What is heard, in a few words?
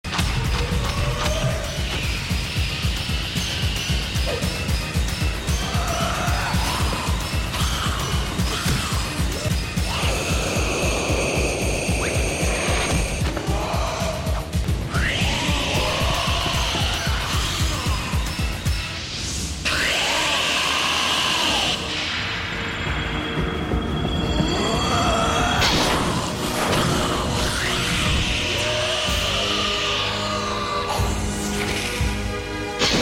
five-headed creature; Frank Welker